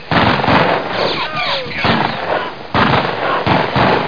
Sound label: melee